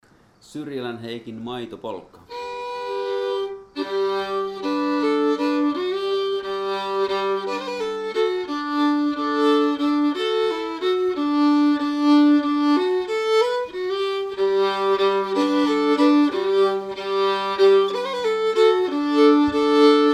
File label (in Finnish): polkka; Maito polkka